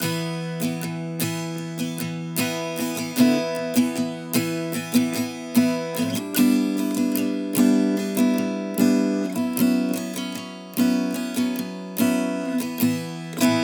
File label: guitar acoustic E maj